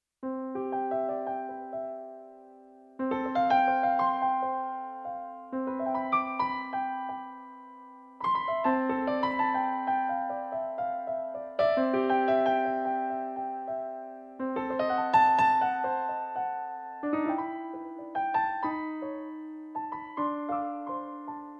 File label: swing